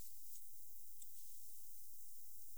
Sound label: Hypsugo savii; Garessio Mindino